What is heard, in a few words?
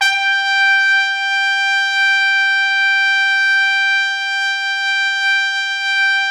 G4, Pop Brass; Best Service ProSamples vol.19; AKAI